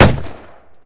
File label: firework single; best service Blue Box